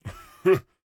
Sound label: Attack Vocals